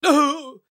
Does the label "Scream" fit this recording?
Yes